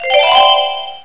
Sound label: GLISSANT; BELLS